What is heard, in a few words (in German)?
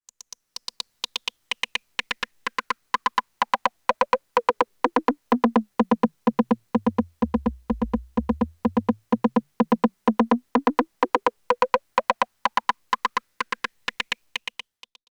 909 Rimshot durch Doepfer A-106-6 XP Filter; SoundForce S